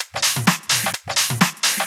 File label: Electro Loop 128 BPM; Vengeance Samples